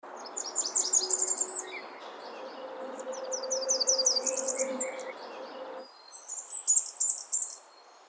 WARBLER BLACKBURNIAN, birds; species/birds; wbba

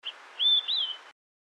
FLYCATCHER OLIVE SIDED; species/birds; wbba

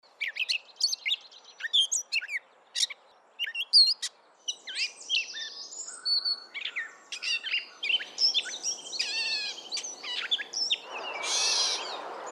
CATBIRD GREY; species/birds; wbba

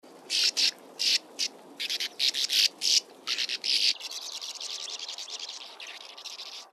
SWALLOW BANK, birds; species/birds; wbba

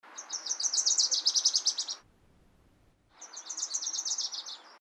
WARBLER WILSON S, birds; species/birds; wbba

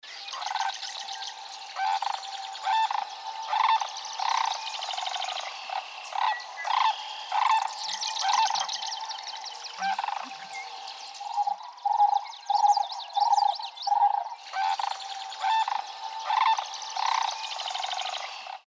CRANE SANDHILL; species/birds; wbba

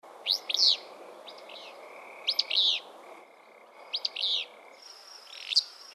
FLYCATCHER WILLOW; species/birds; wbba

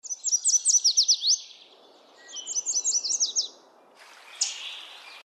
WARBER YELLOW, birds; species/birds; wbba

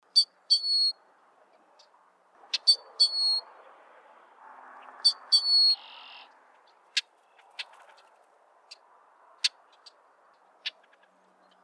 BLACKBIRD BREWER S; species/birds; wbba

